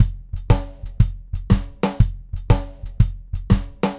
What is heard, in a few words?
Red Maple Groove 120, DW Red Maple Kit, Vital Drums; 120 BPM; Sonic Foundry (Sony Creative Software)